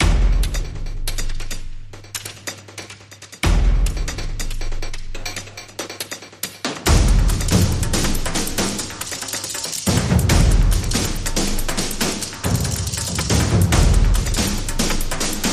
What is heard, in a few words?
Tribal Thunder